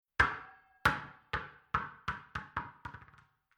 Large Plastic Ball Dropped On Ground Bounces Rolls Away To Right